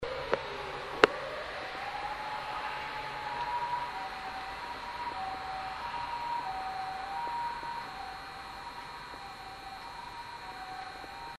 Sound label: to siren